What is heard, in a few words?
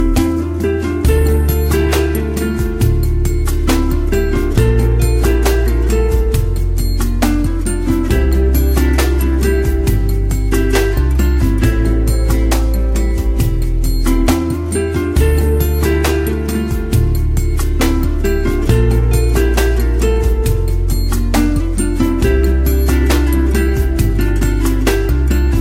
Romantic Guitar